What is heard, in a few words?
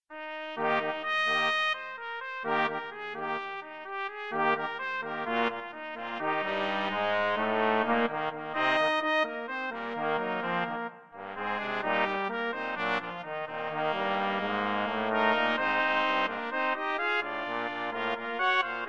rock Brass, brass quartet; joy to the rockin world